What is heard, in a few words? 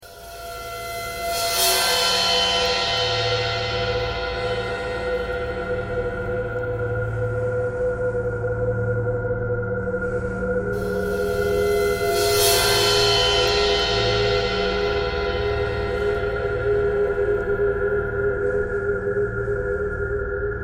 ice bowed cymbal traffic kcore birds a thread pentatonic; bowedmetal